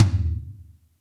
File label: TOM G3 SFT R; Zero-G; Chemical Beats